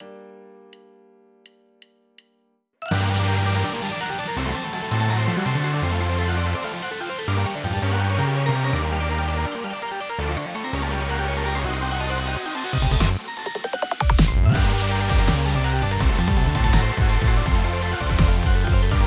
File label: inst 165bpm